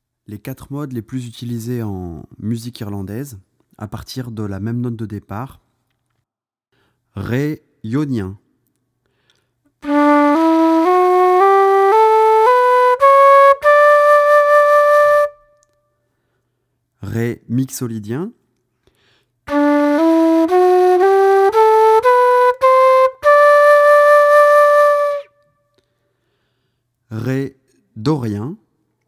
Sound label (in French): Les 4 modes irlandais, irish flute